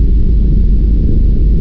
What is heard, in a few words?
roof